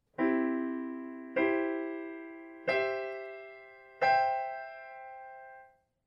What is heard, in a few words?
Chords can be inverted